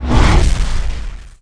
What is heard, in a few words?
Launch; Net Power 2000 #2; WoTdemo; Angreal